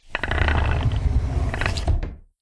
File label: Sliding; hero in the ocean 2